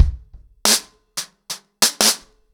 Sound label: 90BPM, ACOUSTIC DRUMS